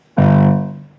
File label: low G, piano